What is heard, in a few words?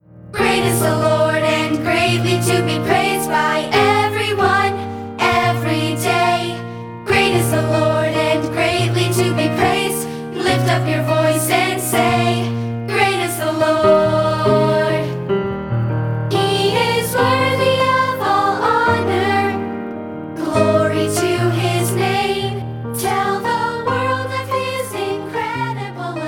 Piano